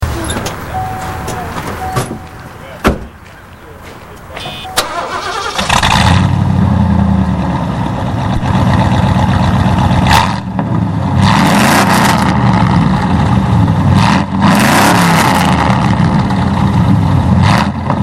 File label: sound bronco, custom